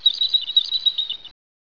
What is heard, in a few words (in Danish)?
fugl